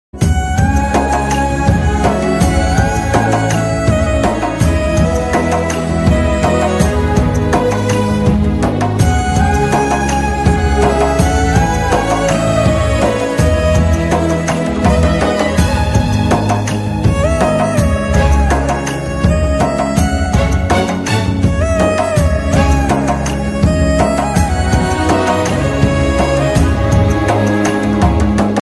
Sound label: song bgm ringtone; rrr